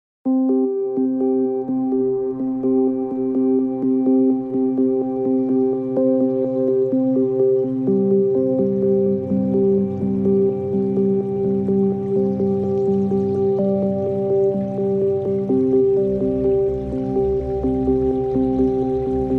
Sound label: Forest Droplets; Glen Tarachow